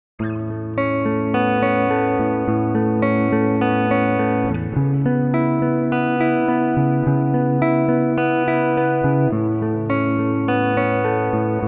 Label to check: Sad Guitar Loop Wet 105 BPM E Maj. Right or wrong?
Right